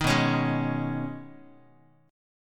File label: B 6th Add 9th B Guitar, chord; strummed